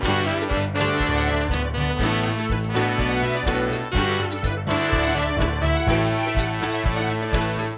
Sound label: overblown